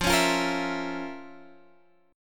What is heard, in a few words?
F Major 7th Suspended 4th Sharp 5th, chord